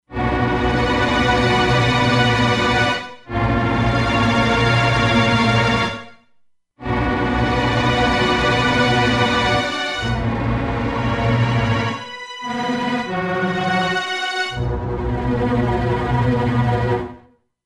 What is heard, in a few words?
Tremulus, E-mu Proteus-2; Factory Presets